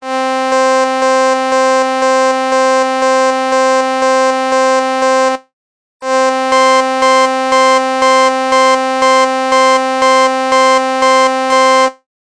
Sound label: string ensemble right